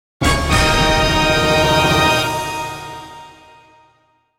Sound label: UI Rank Up; Blue Guardians